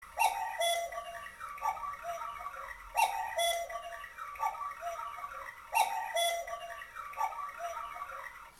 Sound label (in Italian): suono cucu; pirondini